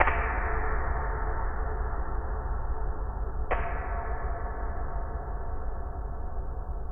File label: Gong Brush Hit, Ambient Metals; Sonic Foundry (Sony Creative Software) - Ambient Atmospheres & Rhythms